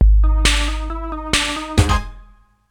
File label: Stage Beaten; Hotel 4; List of Hotel Mario media